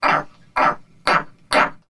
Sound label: Frog California Tree